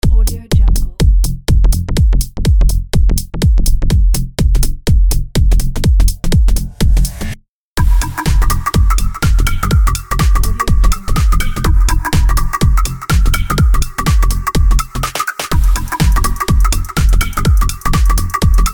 club minimal techno

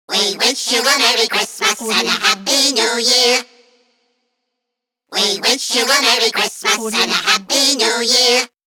elves singing we wish you a merry christmas; applehillstudios

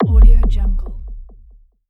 boom kick

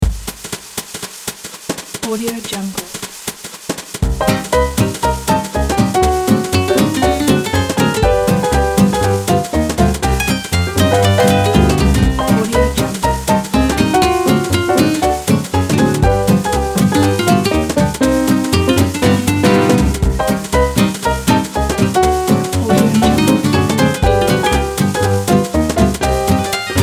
jazz comedy

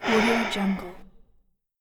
human male sighing

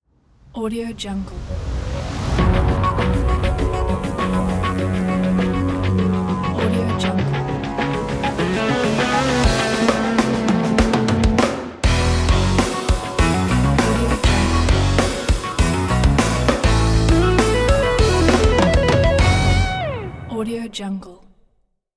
show ident

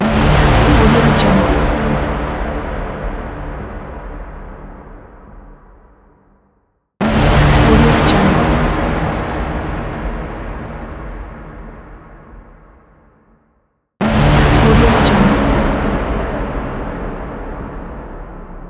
cinematic trailer mega horn

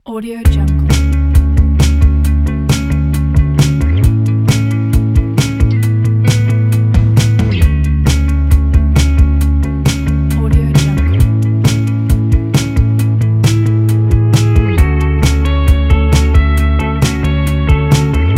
lofi indie pop

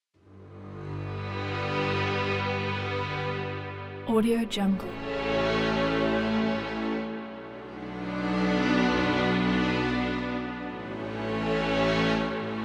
emotional orchestral beautiful trailer

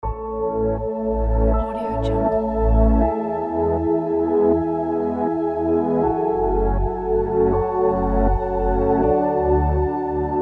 the calm intro

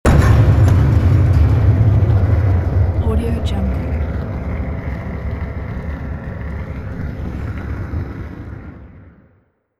cinematic impact flames

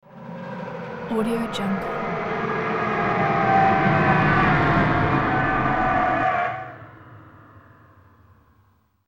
alien encounter

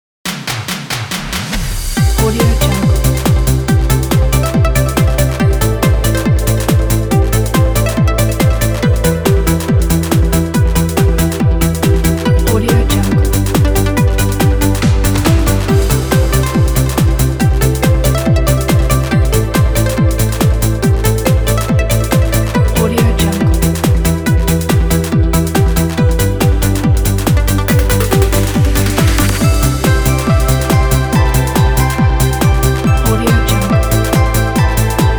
this is 80s

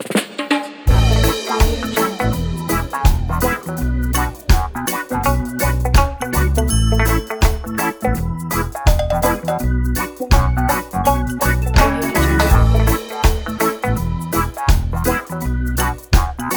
reggae

